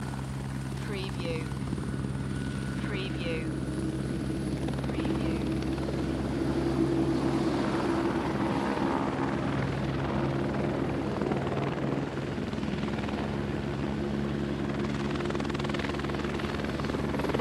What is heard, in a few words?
Helicopter Hover At Distance